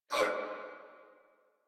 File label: pain crewmen; Dialog; Barks; Battle; Warframe; Corpus Crewmen; Crewman B